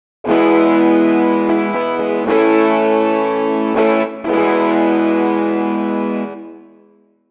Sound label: fuzz e guitar; rock and pop